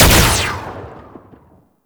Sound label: Laser Fire; Enemies; Weapons; Warframe; Tau; Sentient Dactolyst